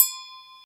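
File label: Agogo bell, Roland JD-990; Drum Samples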